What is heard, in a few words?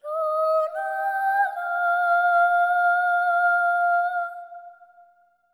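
BOY phrase, Symphony of Voices; Spectrasonics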